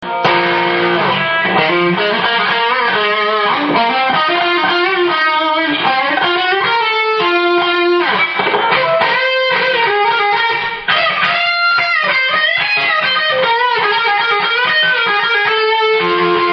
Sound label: tube ch2 boost